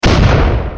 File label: explode; shaoxiadiablo